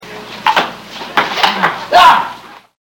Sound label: PING PONG